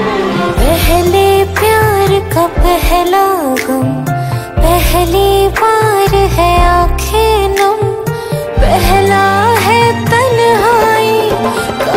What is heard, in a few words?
New Song Tone